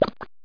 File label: BLOOD